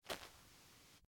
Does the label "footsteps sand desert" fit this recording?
Yes